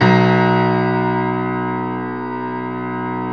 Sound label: Piano B 0; Proteus 2000; Digital Sound Factory Proteus 2000